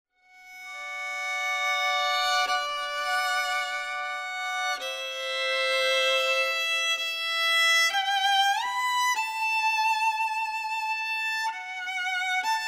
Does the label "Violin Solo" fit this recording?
Yes